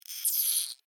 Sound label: Insect Voice Cry, Animal, Insect, Voice, Cry, Emotion, Vocalization, Bug; Pain